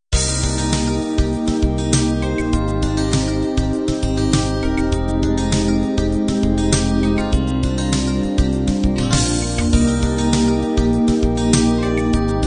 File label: groove ballad end